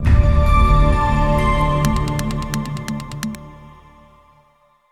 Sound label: Corp Stinger; Brink